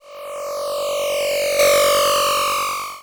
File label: game lost, laser; kokogems